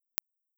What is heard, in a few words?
impuls